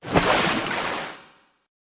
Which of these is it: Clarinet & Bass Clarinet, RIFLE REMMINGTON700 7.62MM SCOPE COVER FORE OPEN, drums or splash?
splash